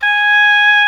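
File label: G#5 soft vibrato, oboe; Northstar - New Gold VOL-2; EBONY OBOE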